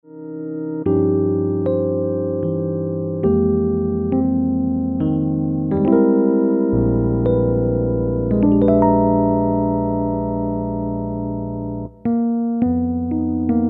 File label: Chromatic Waltz